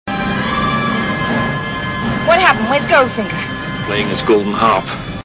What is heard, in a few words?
harp